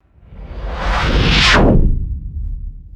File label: sci fi portal closing whoosh and thud; magic-scifi